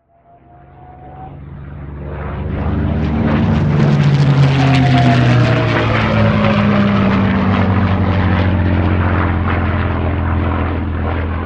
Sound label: Stuka flyby